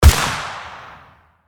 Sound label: HK416 assault rifle single shot